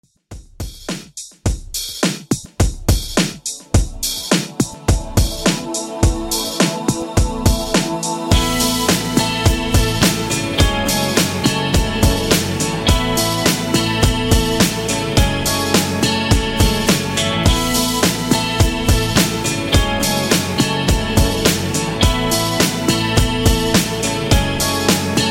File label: Classic Redrum 105Bpm